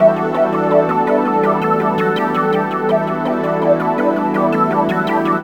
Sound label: Arps; Jungle; Riffs, Arps & Hits; Blu Mar Ten; Jungle - 1989 to 1999